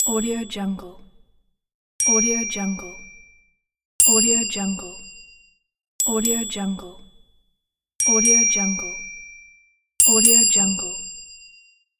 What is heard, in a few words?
ding